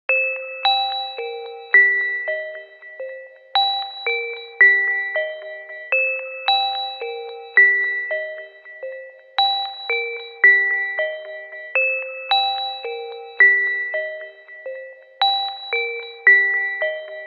Ring Buoy; HTC Touch Pro2

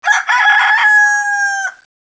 alarm rooster; HTC Touch Diamond

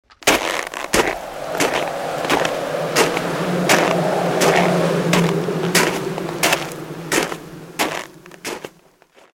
Walk In The Snow Alarm; Snow Flake

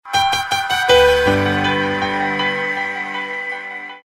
power down tone; Motorola V235 T-Mobile

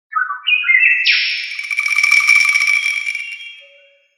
Safari videoringtone; Nokia; Audio themes

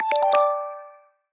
Stone Skimming Day; Xiaomi Redmi-8A

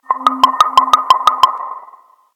Organic, ringtones; Motorola MOTOLUXE-XT615

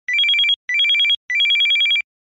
Single Tone

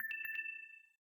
Low Battery in call

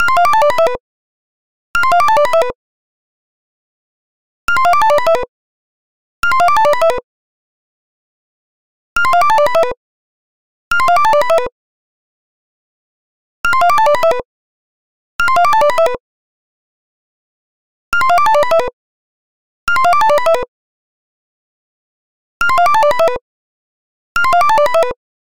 Computer, phonetones ringtones

